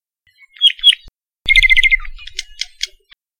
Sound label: iiwi call